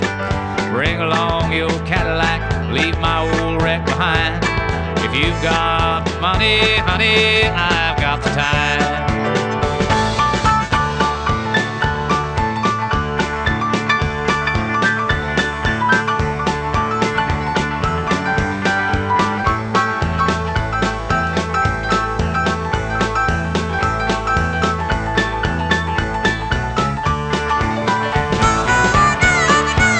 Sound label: country